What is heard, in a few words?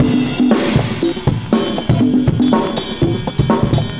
cooling 120, loops, 120bpm